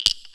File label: capture, Go board; Seki